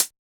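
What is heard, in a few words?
hi-hat Closed, Yamaha DJX 2B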